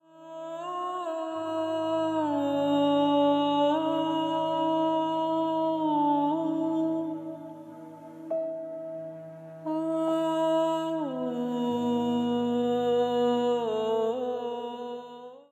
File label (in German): kuhherden horn